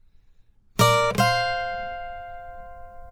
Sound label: 077 Pop Ac-Guitar Dm; Pop Rock